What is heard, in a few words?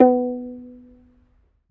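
African HARP B 4; AFR. HARP1; Heart of Africa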